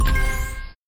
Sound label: hit blast right answer